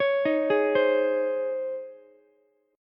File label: C sharp Major 7th Suspended 2nd, Ukulele; C#M7sus2 arpeggiated; JGuitar